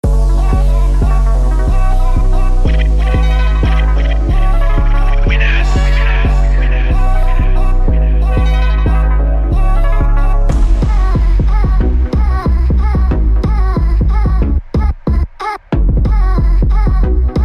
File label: Instrumental